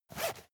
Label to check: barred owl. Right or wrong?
Wrong